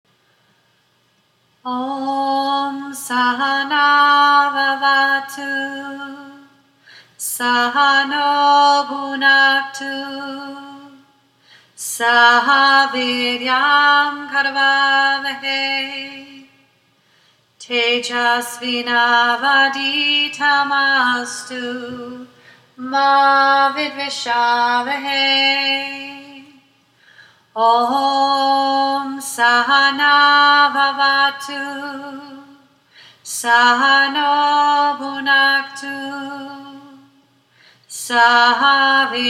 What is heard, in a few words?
Shanti Mantra